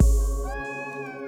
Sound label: Kick Meow, Drums; BB 2HTC Samples Vol 4